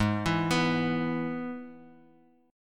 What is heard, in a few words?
Ab Minor Sharp 5th Ab, Guitar Open F; arpeggiated; x,3,4,6,x,x